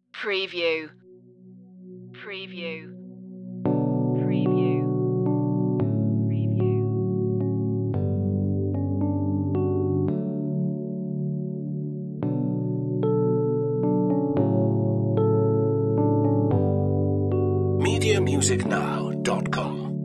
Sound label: no vocals